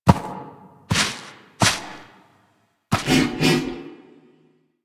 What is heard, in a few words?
Ram Scratch Ground; Animals; DE; Warframe; Quests; Duviri